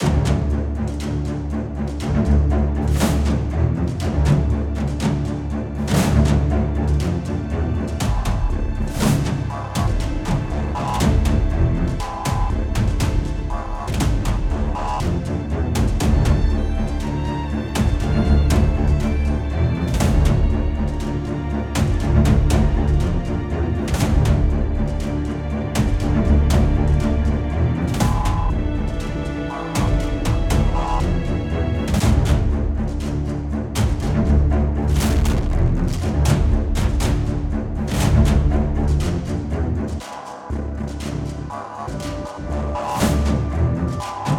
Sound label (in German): Fight Trauer Vorschlag Mehr Glitch; Moods